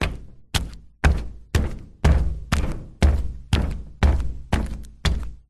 footsteps flip flops walking metal external platform floor; foley; zapsplat